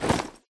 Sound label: jump; Golden Crayon Sounds